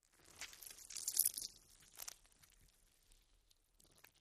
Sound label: GUT SQUISH, gore